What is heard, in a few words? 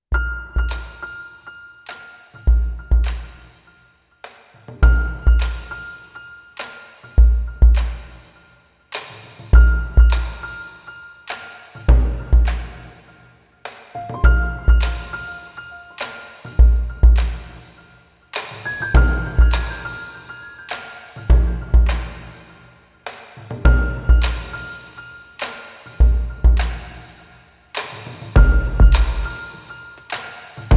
Vector pure rhythm; abenteuerlich